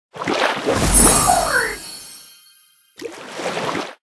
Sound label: win screen jelly; Candy Crush Friends